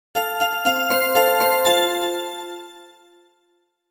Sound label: gentle text tone